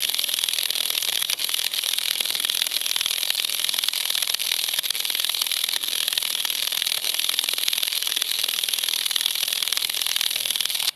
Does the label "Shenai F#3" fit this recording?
No